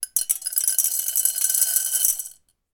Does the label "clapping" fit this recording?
No